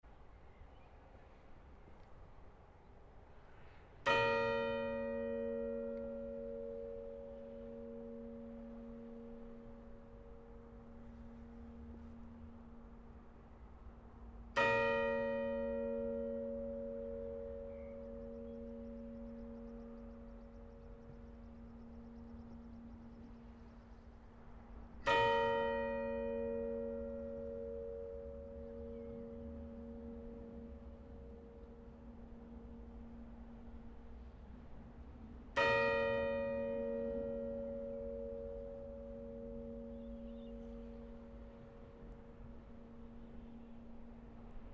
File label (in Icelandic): kopavogskirkja likhringing, kirkjuklukkur